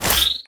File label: atk spirit break